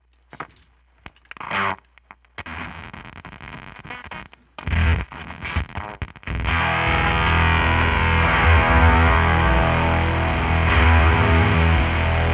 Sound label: digital sound bath; weeklybeats 2024